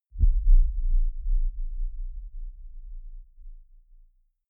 deep sub hit heavy

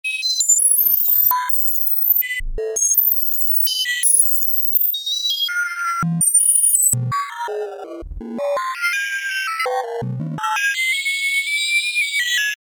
highfrequent random beat

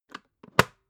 water boiler close top

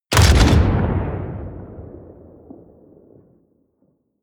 artillery medium close

